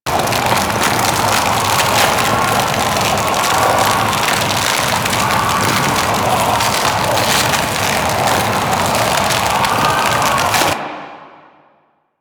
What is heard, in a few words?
Elemental Breath Ice Impact Loop; Warframe; Dragon